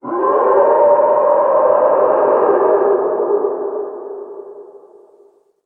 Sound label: leaper alert; trespasser